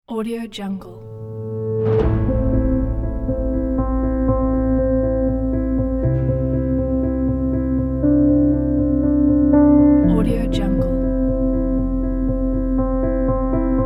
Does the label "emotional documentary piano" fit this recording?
Yes